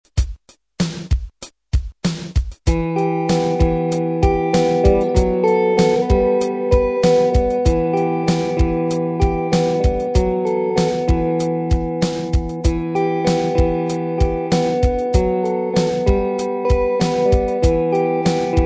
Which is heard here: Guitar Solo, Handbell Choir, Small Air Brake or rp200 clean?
rp200 clean